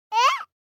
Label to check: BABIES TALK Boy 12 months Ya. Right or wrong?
Right